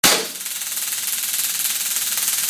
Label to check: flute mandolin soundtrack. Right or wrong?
Wrong